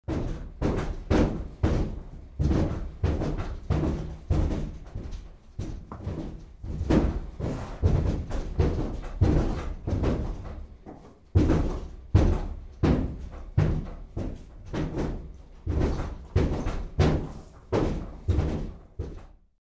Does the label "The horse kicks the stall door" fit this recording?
Yes